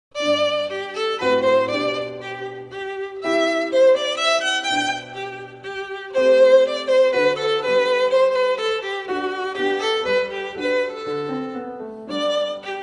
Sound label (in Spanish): Minueto en sol; Bach; Libro de Anna Magdalena Bach